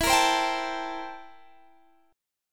C 13th E

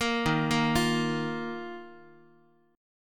D sharp Suspended 2nd, Guitar; arpeggiated; JGuitar

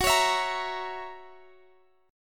Gb 7th Gb down Guitar Half Step Down, chord

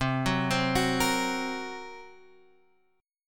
A sharp Suspended 2nd Sharp 5th C, Guitar

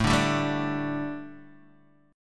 A 7th Suspended 4th Guitar Open D, chord

